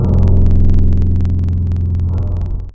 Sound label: Renaissance Lute; Synthetic's Serum Collection